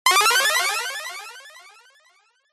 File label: multimedia game sound positive action tone; zapsplat